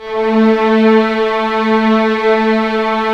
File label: orchestral strings f slow; Roland LCDP13